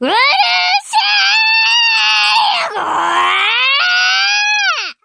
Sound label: cute anime girl raging